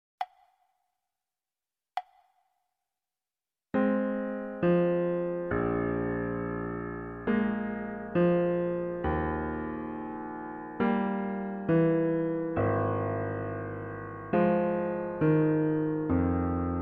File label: clarinet sonata; brahms